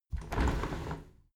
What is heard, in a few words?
window handle squeak turn open regular; objekte; fenster